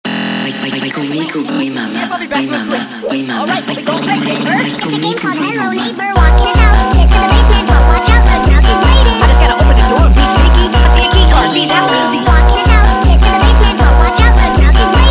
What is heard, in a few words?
Sped Up, funk